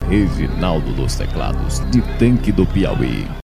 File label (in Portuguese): teclados vinheta